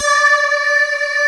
X-MAS G4, M-1; Greytsounds VOL-1

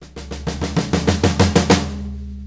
Blues Fill; Sonic Foundry (Sony Creative Software); Drum Tools

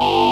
AAH PULS, STRING CHOIR; Pro-Rec; Synthsonic

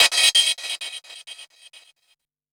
Gated Cymbal; musicradar

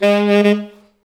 SAX Alto STAB, Alto Section FX

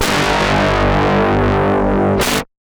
guitar stab

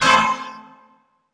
brass, Drum & Bass 160 BPM; Ueberschall; Drum & Bass Flash Trackz

